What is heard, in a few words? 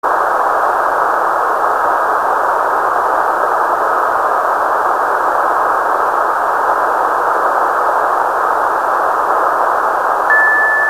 Spooky signal